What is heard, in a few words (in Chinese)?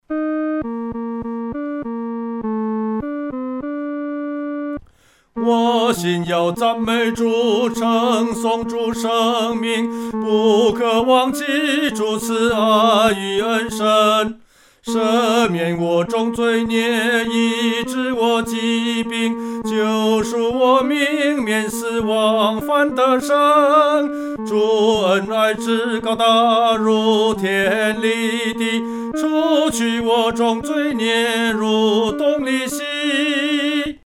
独唱 第三声; 赞美主恩